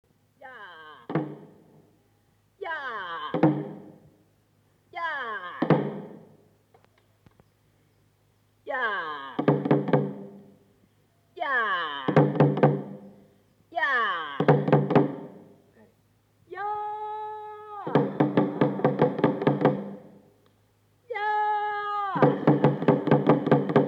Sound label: Engoma, instruments uganda